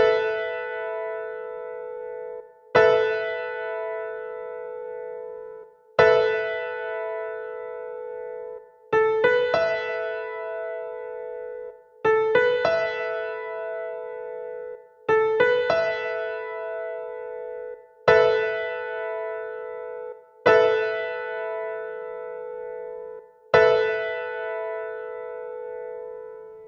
Asus2 Piano Chord

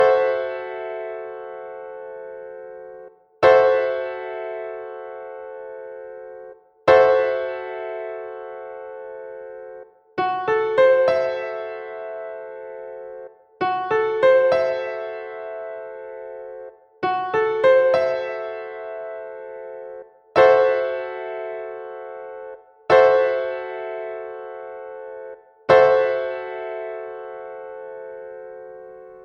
Fism7b5 Piano Chord

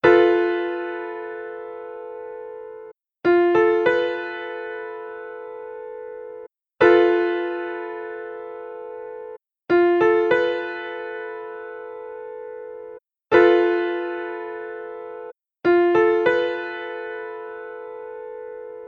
Fb5 Piano Chord

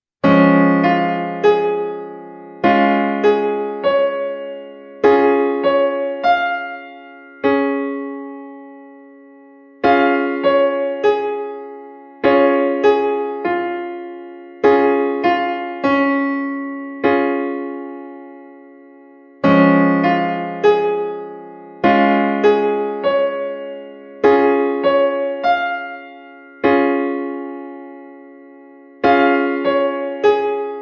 Cis Db Piano Keyboard Chord

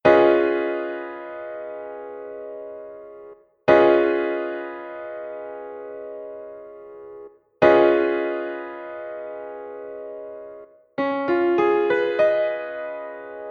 Db9 Piano Chord

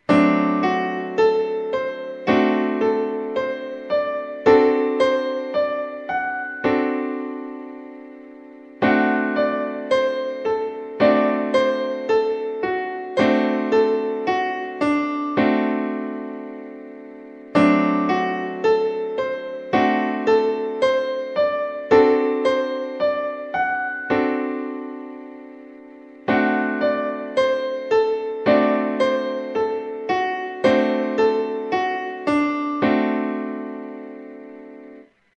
D7 Piano Chord

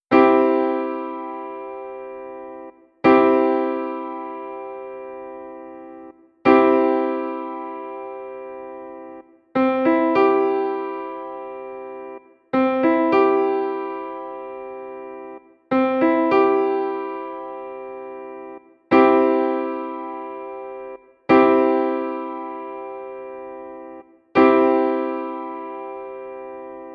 C Dur Piano Chord

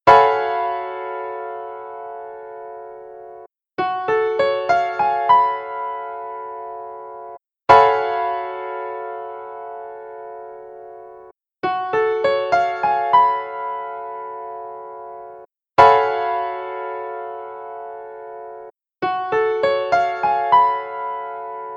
Gbmmaj11 Piano chord

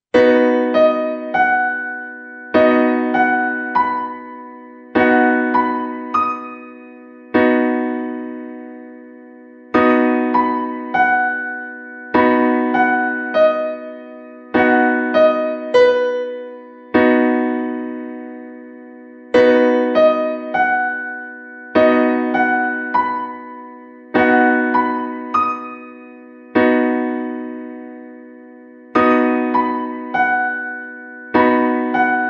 H Piano Keyboard Chord

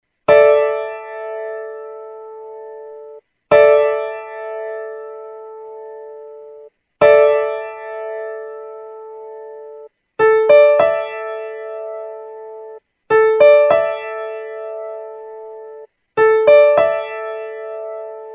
A4 Asus4 Piano Chord

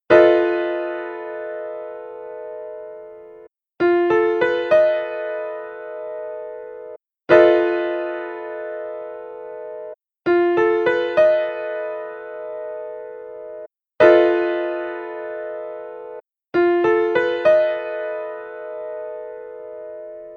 F7b5 Piano Chord

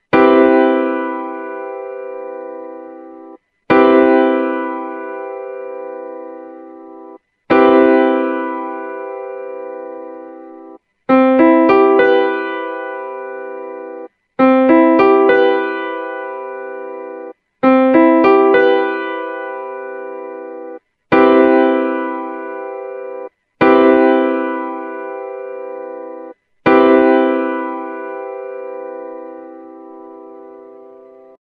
Cmaj7 Piano Chord

